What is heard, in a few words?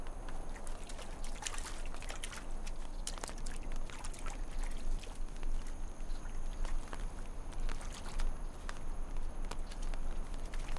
nature bath